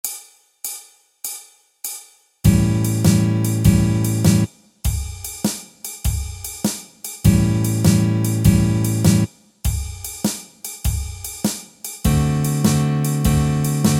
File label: Blues Guitar